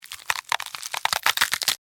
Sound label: Flesh Rip Tear, Bone, Crack; Horror; Blood and Guts; BlastWave